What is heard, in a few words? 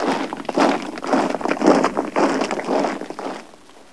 barracks